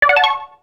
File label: RED COIN; YWW